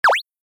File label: Cartoon designed boing